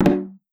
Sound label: Transfer Start